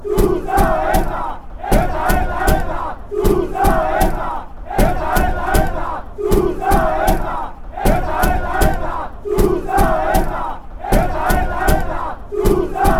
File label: eta, fanchants